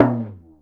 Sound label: Toms, Real